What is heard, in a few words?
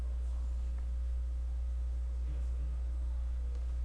bell